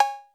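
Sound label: 808 cowbell, TR-808; Crypton - DrumBase5000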